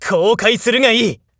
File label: attack, voice; jakigan